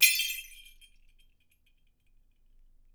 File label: CLANG, Percussion; Miroslav Vitous